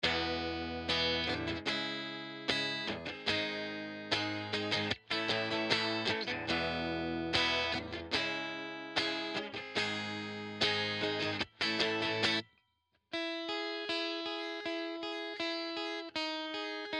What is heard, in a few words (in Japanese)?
エレアコ EQ フラット; Brit and Clean